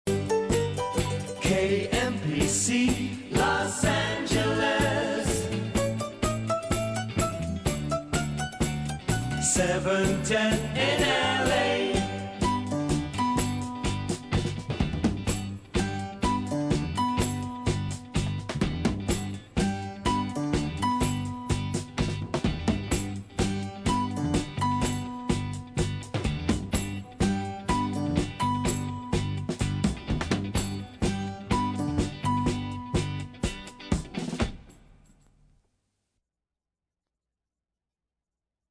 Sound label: Honky-Tonk Piano